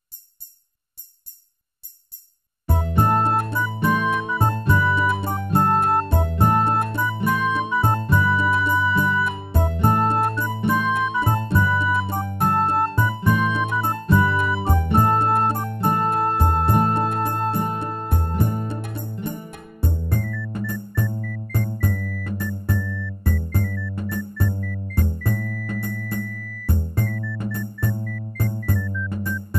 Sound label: Tavern